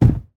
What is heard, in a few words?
Jump Carpet Land; Devour; devour 2021 gamerip pc macos